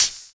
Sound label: Hhat, Hihat; Equipped Music; Loungin House